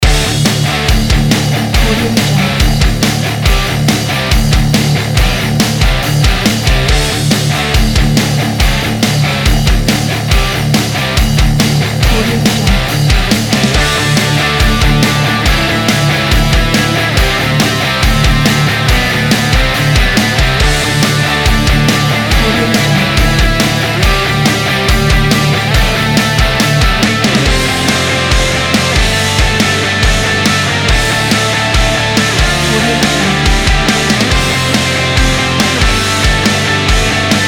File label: metal